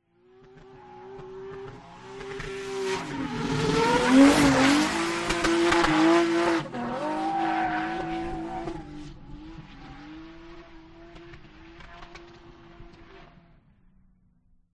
drift car approaching fast with backfire passing with screeching tires; sound ex machina